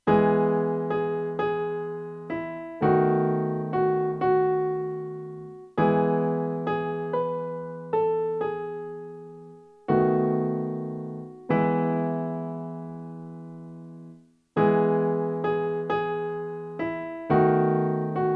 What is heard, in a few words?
transpose practice evening hymn in e major